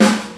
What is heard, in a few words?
70s bassy steel snare drum g sharp key